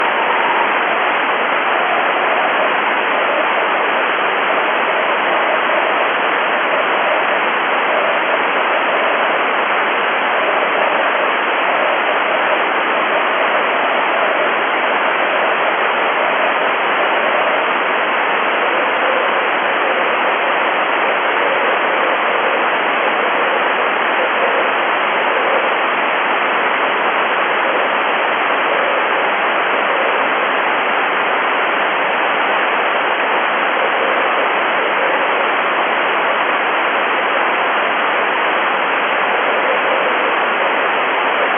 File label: 3cm CW 500hz; Later, 500 Hz pitch (ah, that is better!); GB2FRA; 10ghz